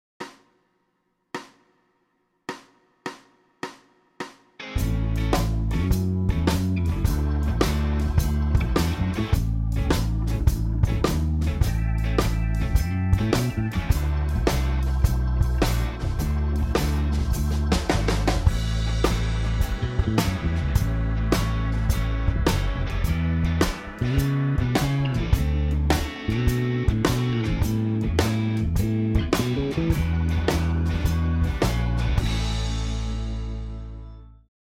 Jam Track A Shuffle 105, Blues; 4 Note Solo

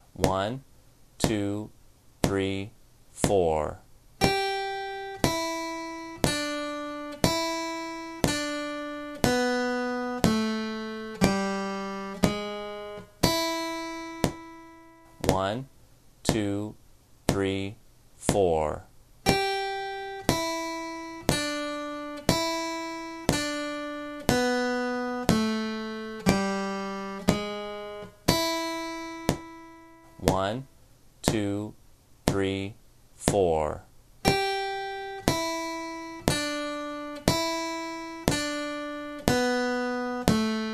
first licks, blues guitar

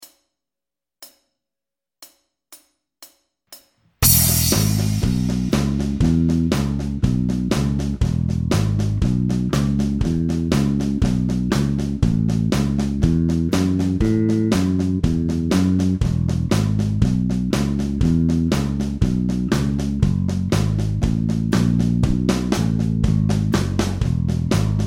fast walking - no guitar

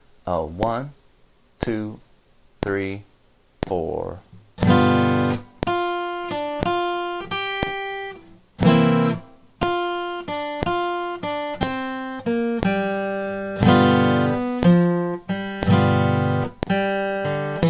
deep sea blues play along

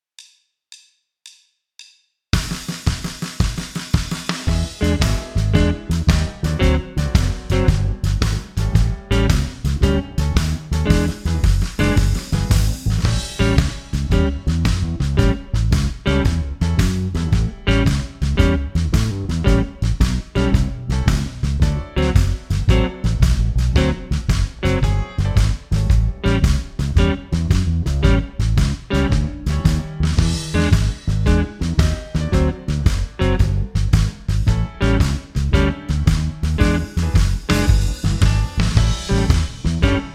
Shuffle A Comping Pattern Rhythm

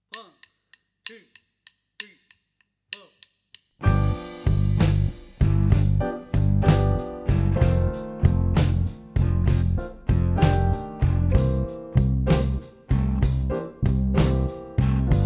Slow 64bpm in C No Guitar; the core position

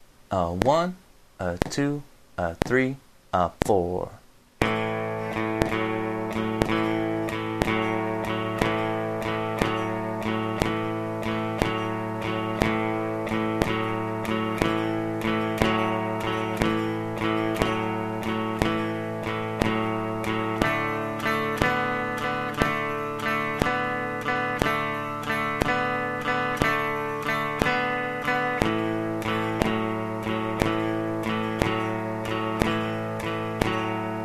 blues in a5 a6 play along, guitar

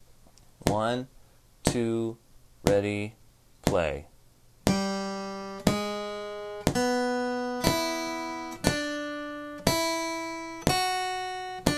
first licks, blues guitar

